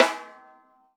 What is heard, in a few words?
Snare Shell Layer